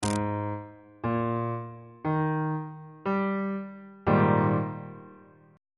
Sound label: g sharp major 7th suspended 2nd chord